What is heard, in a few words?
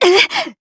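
Vox Damage Japanese; heroes; Yanne; kingsraid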